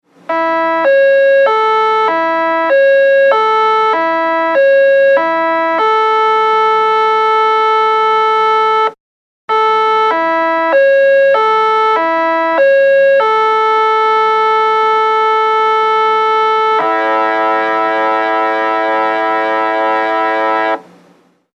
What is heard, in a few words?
music horn